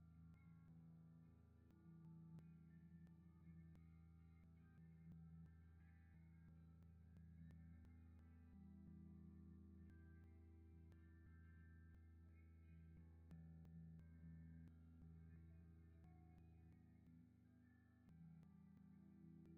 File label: ELECTRIC GUITAR; NAMM 2018; MyMix Recordings NAMM 2018